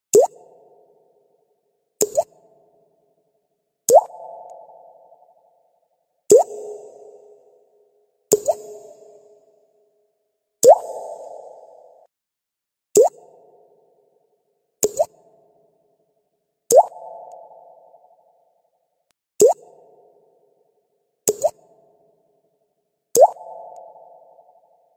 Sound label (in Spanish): Sonido gota de agua efecto